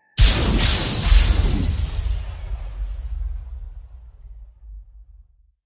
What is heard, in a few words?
Impacts Combo; Gothic Storm Music; Advanced Sound Design 2012